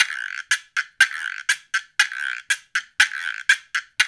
120 CHA, Cha Cha Cha, African Contemporary; Spectrasonics; Supreme Beats

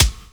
BD, BASS DRUM, 70's Breakbeats

